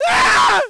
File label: aah